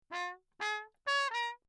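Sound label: trumpet mute phrase; Perinétventiltrompete; Lechner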